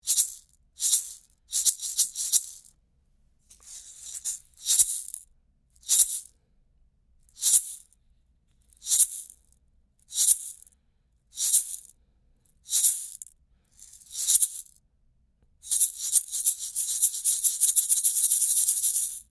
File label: maracas